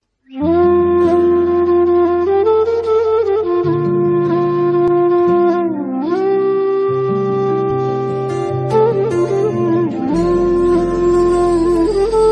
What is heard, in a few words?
Krishna flute bgm ringtone kannada ringtone; kiccha sudeep